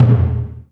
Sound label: LARGE DRUM, JAPANESE PRC